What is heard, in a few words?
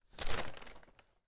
newspaper turn over